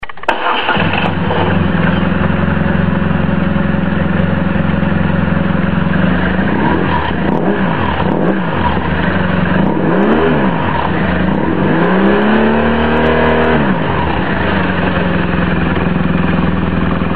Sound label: bmw r1200rt